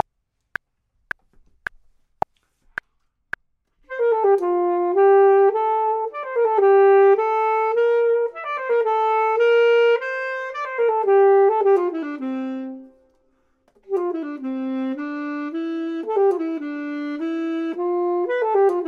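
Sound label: top, Saxophone and Clarinet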